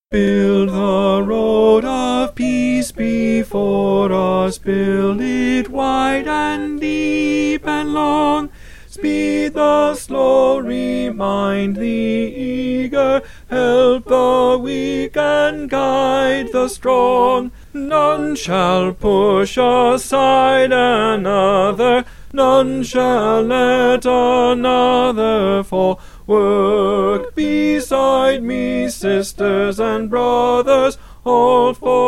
tenor; 2023 Fall Repertoire

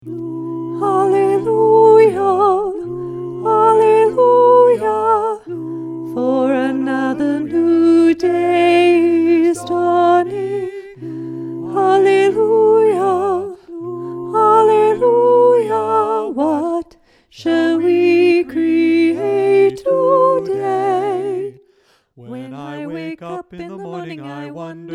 Soprano; 2023 Fall Repertoire

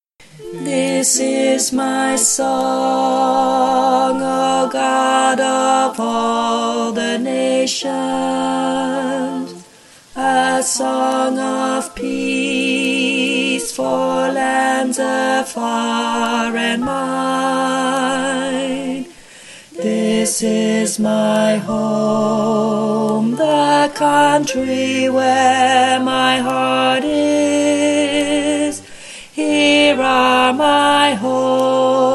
tenor

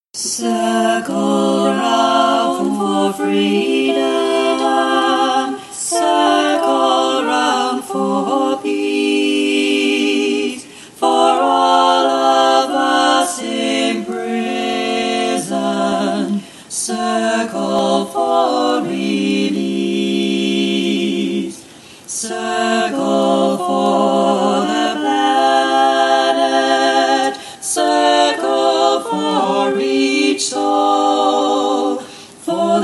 Circle Chant all, choir

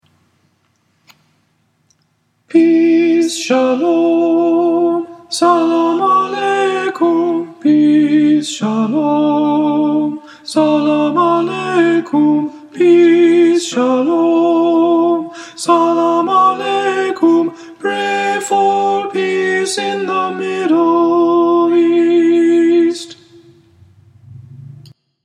Peace Shalom Salamaleikum Alto

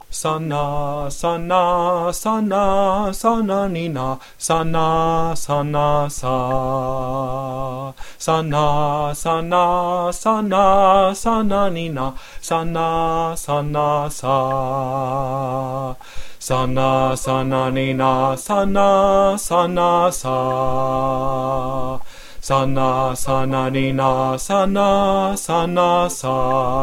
Sana Sananina bass, choir

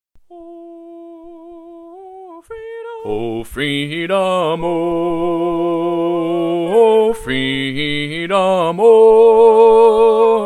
bass; Oh Freedom

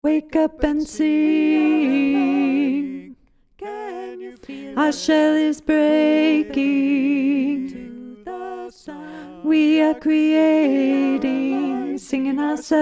Alto; 2023 Fall Repertoire

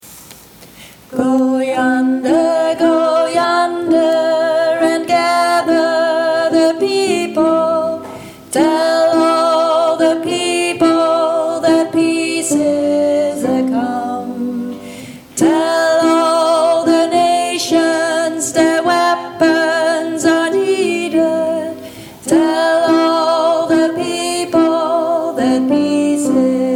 Peace Is A Come alto

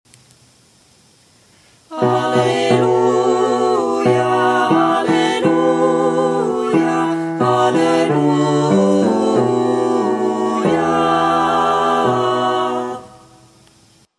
Alleluia Russian, choir

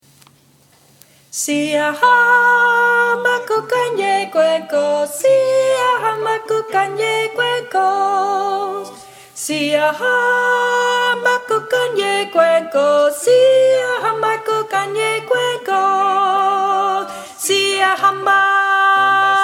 Soprano; Siyahamba

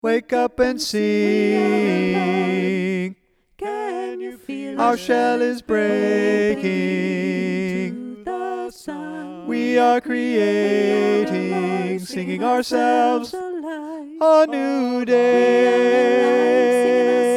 Tenor; 2023 Fall Repertoire

